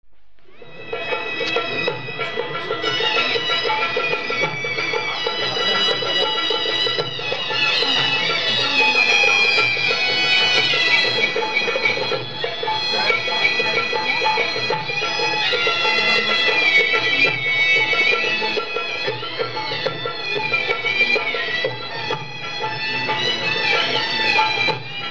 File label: Music From Varanasi, Field Recordings From India Nepal; Jani Hirvonen